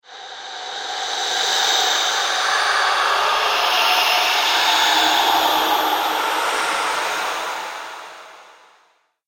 Lair Of The Vampires, Scary Soundscape Sound Effects